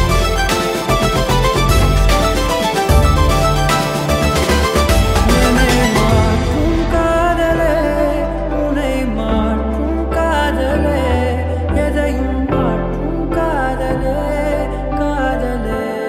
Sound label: Ringtone